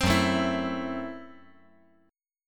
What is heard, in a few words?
Gb Minor 7th Flat 5th